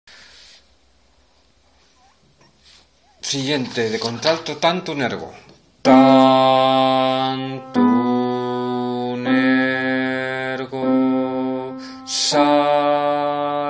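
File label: alto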